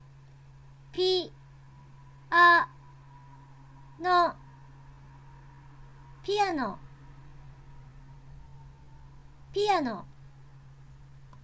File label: piano